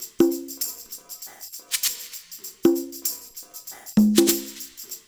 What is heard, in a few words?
percussion loop; InVision Interactive; Percussion Slam; KWICKIE